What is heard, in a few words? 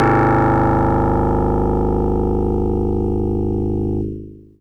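Harpsichord DX100 G sharp 0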